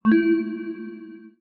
button

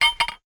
metal

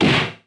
hit

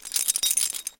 keys

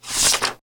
page

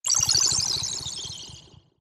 magic ice

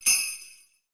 small bell

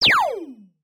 beam gun

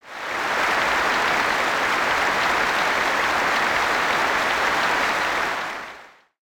applause

